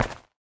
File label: footstep; smite; apollo v4 skin14